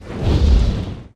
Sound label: Dragon Fire Whooshes With Fire Crackle Element